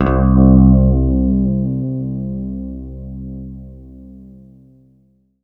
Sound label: C3, synth; 90 s Sample CDs; NiceBeats; SynthLine VOL-32